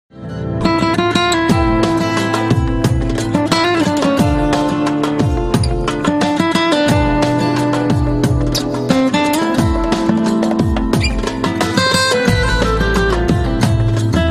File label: arabic guitar